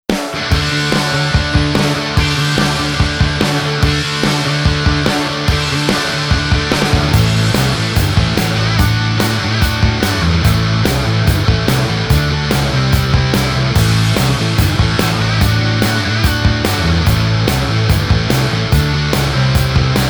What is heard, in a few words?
Epiphone Les Paul